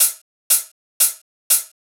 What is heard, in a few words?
count off hihat 120bpm